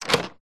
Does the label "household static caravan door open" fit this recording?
Yes